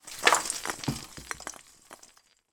household christmas tree fake shake quick short baubles decorations wobble; fall off; zapsplat